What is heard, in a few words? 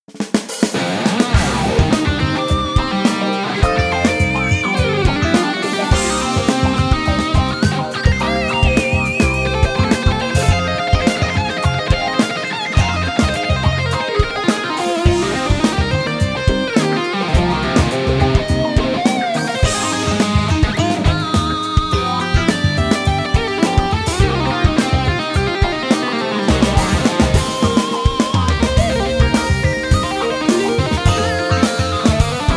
Electri6ity Tele Funk Solo